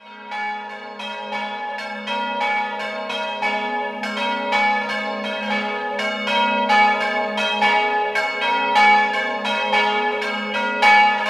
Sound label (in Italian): Cordovado Santuario, campane